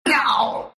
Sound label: pain, ru